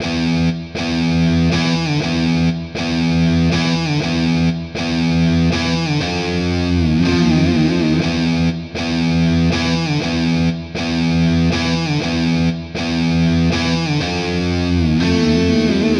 120 e gtr loop

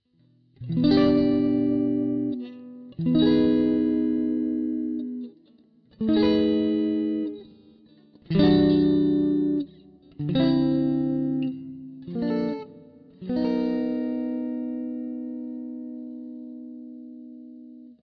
clean neck mid fender

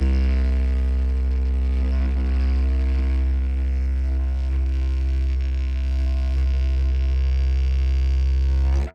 organic didgeridoo elongated

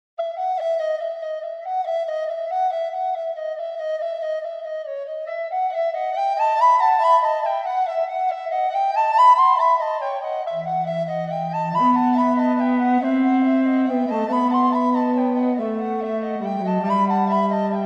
recorders; Embertone

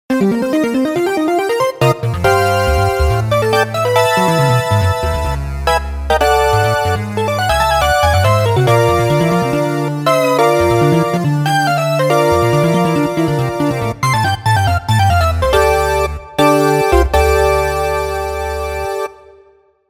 lead keyboard

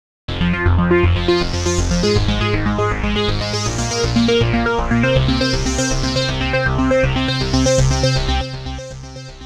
seq 3 steps sandwich saw

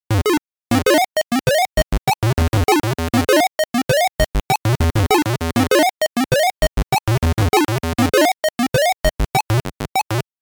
acid chiptune

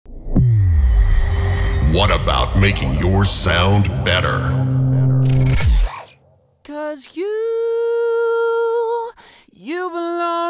vocal split

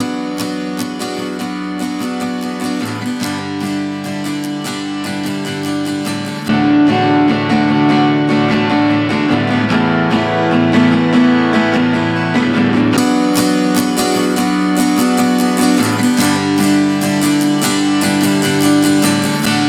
comp on guitar; lolcomp

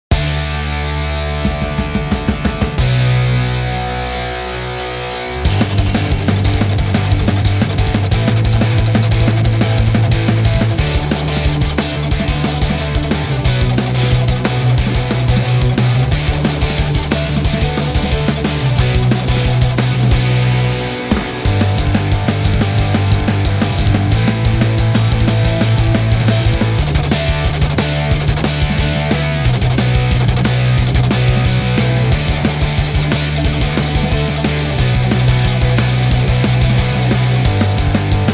virtual guitarist iron

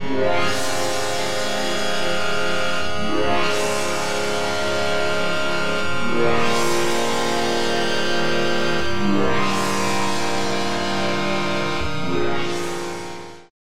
symmetrical wavy lines

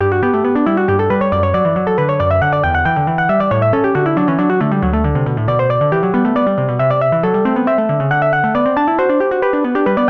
grand piano

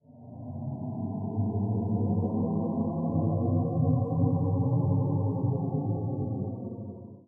eerie

